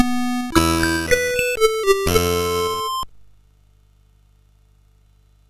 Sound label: sid MOS6581R4; Dark Lord